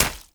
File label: Fey Flock Attack Hit; Powers